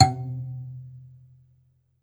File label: BASS KALIM, Kalimbas; E-MU Producer Series Vol. 7 – Old World Instruments